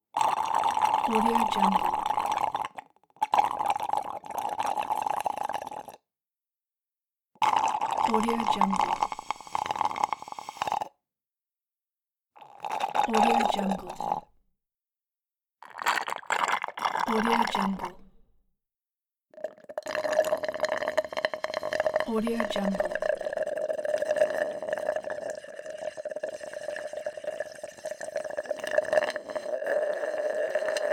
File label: straw slurp; soundjay